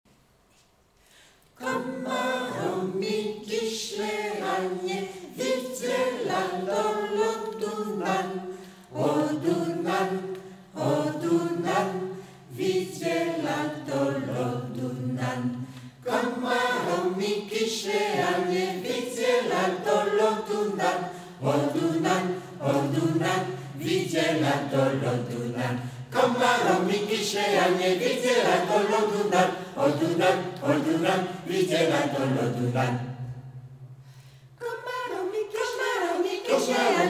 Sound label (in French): chant hongrois